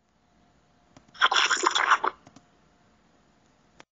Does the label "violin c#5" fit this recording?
No